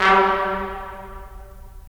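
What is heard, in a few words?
brass trumpets staccato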